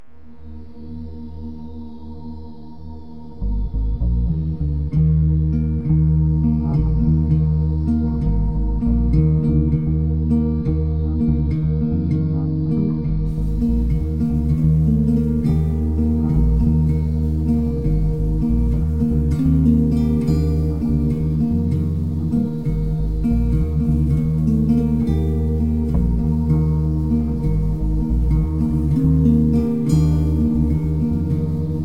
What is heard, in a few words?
Acc GTR chillgroove; D GTR-chillgroove by Javolenus